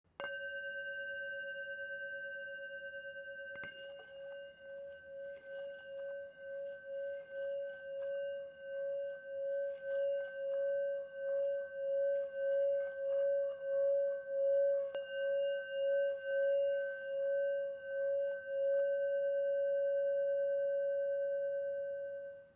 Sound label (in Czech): tibetska misa